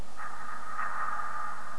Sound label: Min Window; TOMB2